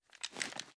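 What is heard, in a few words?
pistol reload empty end; gmod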